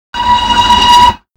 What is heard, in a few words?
whistle, castle class